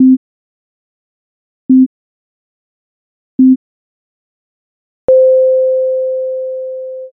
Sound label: jiho